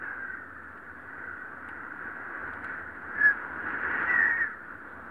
Wind Whistle Loop; weather fx; Silent Hill Downpour

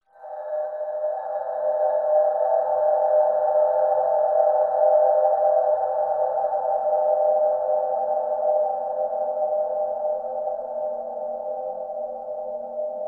Stone Metal High; horror and atmosphere fx; Silent Hill Downpour

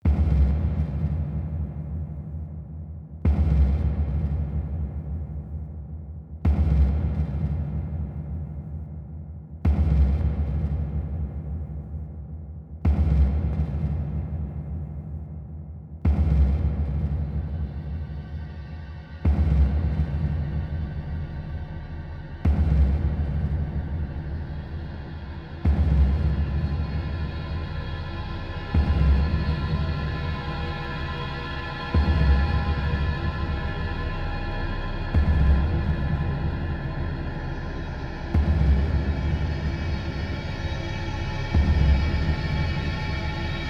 MUSIC SANDARK; sh origins

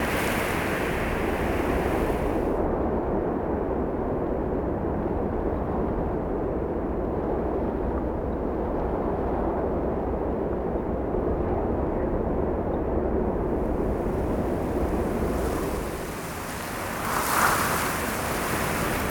Cliff Wind Loop; Diner; Silent Hill Downpour

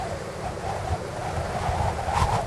Wind Gusty Loop; Silent Hill Downpour

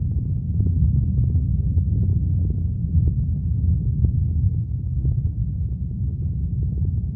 Dark Forest Loop; Diner; Silent Hill Downpour

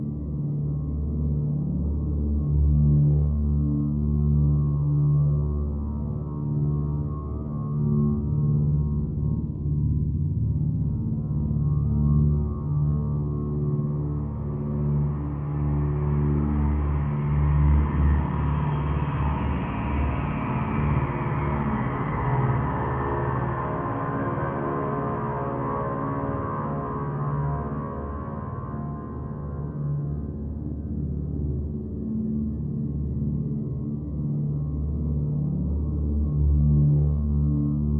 Stalk String Pads Loop; Silent Hill Downpour

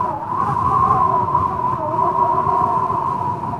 Wind Whistling Loop; weather fx; Silent Hill Downpour

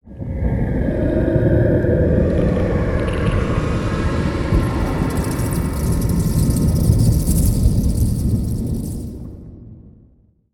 Cave Deep Slow Movement; horror and atmosphere fx; Silent Hill Downpour

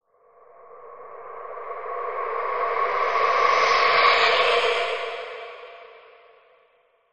Evil Calm Loop; horror and atmosphere fx; Silent Hill Downpour

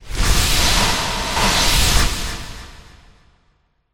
Teleport Effect; horror and atmosphere fx; Silent Hill Downpour

